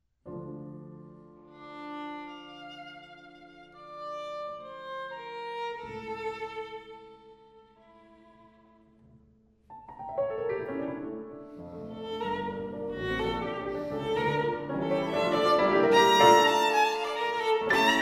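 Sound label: violin sonata; gade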